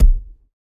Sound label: Ball Player Hand Hit Tackleball Med